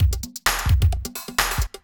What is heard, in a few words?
electro kit 130